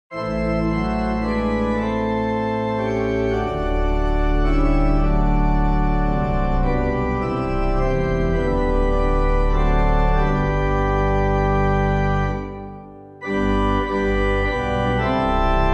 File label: organ